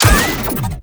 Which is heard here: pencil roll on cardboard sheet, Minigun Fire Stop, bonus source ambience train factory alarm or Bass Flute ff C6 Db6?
Minigun Fire Stop